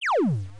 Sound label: shoot; hytopia; payload-game